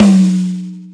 mid tom sound f sharp key, tom one shot tuned to the F# note. Loudest frequency: 479Hz; tom drum samples; one shots